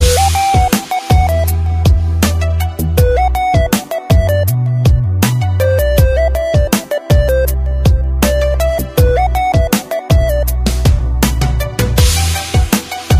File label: nice melody